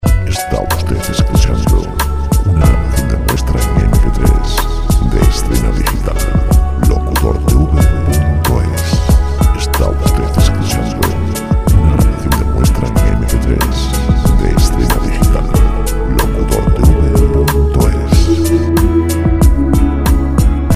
fuentes chill; music chillout

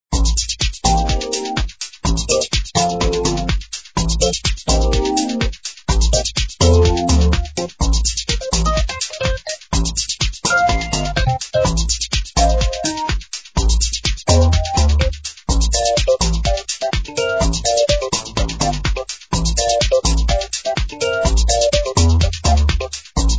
Jazz 125

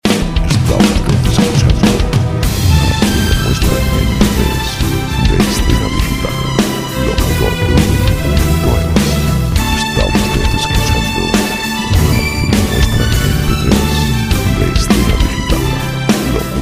101 rock; Música de rock

